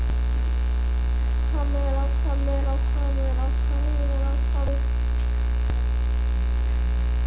camera

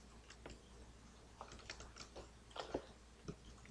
laugh

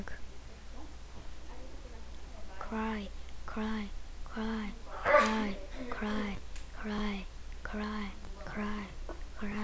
cry

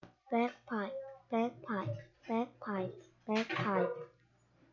bagpipe

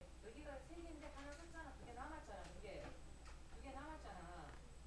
cry

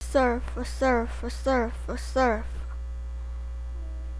surf

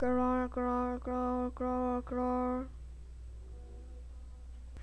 growl

